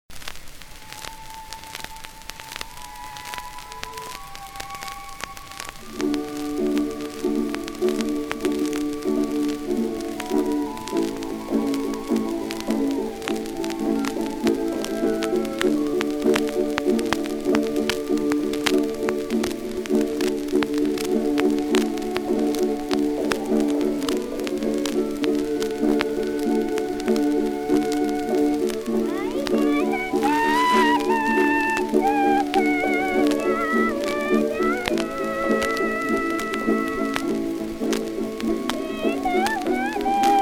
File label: Kronjong; Concours